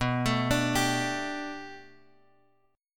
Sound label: B Minor 7th Sharp 5th B Bass Standard, chord; Bm7#5 arpeggiated; JGuitar